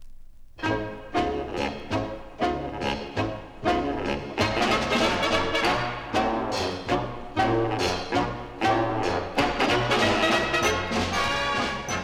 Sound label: breakbeat